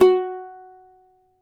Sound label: CAVAQUINHO F#, Guitars